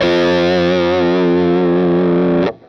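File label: fuzz guitar vibrato; FUZZGTR1 VIB; Sonic Reality; Vintage Timetraveler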